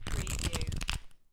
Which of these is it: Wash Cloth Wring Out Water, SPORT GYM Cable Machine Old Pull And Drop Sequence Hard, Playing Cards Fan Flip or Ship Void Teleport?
Playing Cards Fan Flip